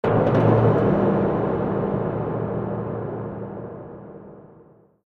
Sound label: spacebase bang; ambient; Garry's Mod; tf2